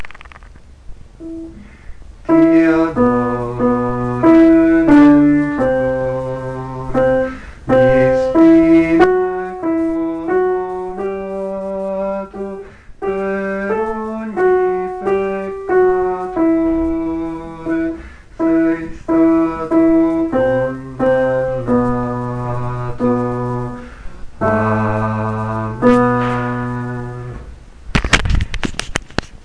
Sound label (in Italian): contralti; Ti adoro Redentore Adoriamo il Redentore